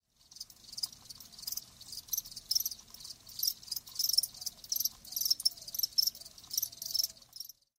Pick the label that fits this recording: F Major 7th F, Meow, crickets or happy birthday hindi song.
crickets